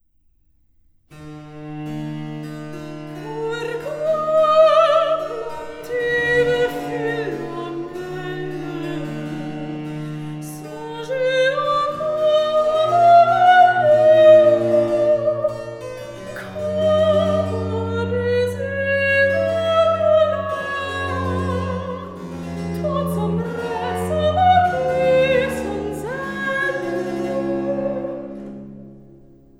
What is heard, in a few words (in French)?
Recitatif; Philomele